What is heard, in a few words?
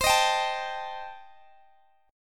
C Minor 6th Guitar Open G, chord